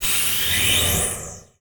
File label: Transition Whoosh Laser; Production Element; Title Transition; AdobeCC